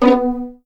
B3 PIZ F, VLNS PIZZ; East West; The Ultimate String Collection

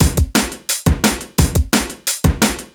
DNB BREAK

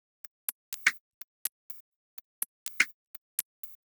Top 124bpm, House Drumloops

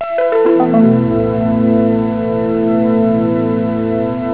harp sus; jazz jackrabbit 2; M8

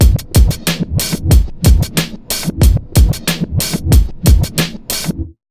92 beat, Underground Hip Hop; USB Soundscan vol.02; AKAI

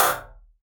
Kaut, One Shots, Tik; Sonic Foundry (Sony Creative Software) - Future Retro Dance Excursions

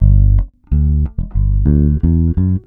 COUNTRY; Best Service Advanced Media Trax Modern Composer

